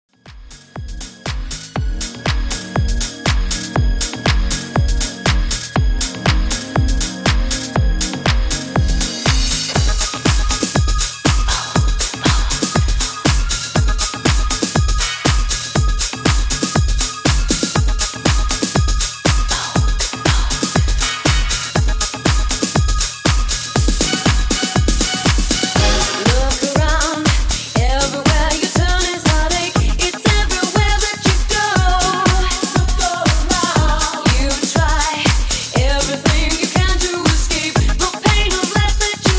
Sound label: Classic 120 Bpm Clean